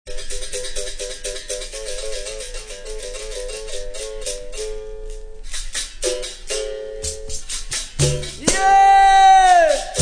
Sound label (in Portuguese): Capoeira Musicas; Esportes; mestre bimba